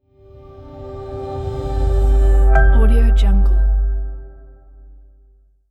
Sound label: modern logo